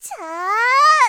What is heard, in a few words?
attack; Eternal Return; Tia; Voice Line; KOR